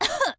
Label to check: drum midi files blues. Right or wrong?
Wrong